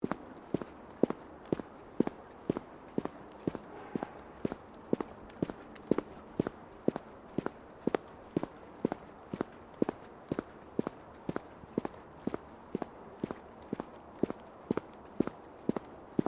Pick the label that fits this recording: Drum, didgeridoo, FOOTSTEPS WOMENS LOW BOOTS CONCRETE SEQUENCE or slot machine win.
FOOTSTEPS WOMENS LOW BOOTS CONCRETE SEQUENCE